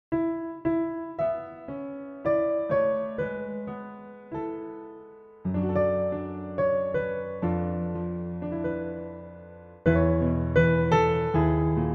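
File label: A to Bb, Backing Tracks for Male Vocals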